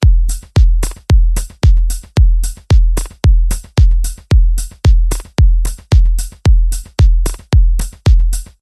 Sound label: SYNTH DRUMS LOOP; AUDIOTENT